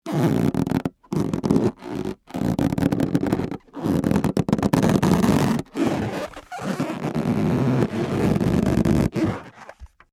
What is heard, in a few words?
creaking gishigishi